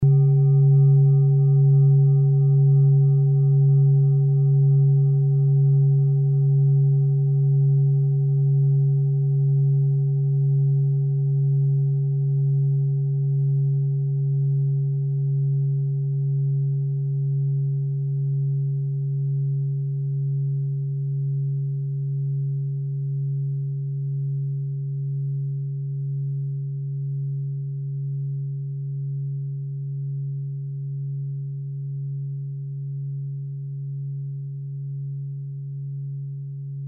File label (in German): klangschale set; Klangschale Nr.4; Gewicht = 1170g; Durchmesser = 21,2cm; Höhe = 10,2cm